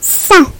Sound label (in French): son aigu